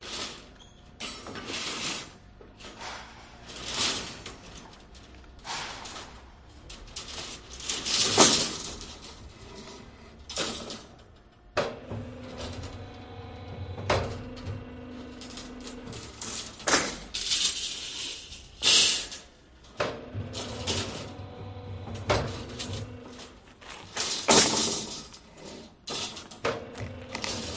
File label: construction site ambience heavy metal work machinery operation; Sound Ex Machina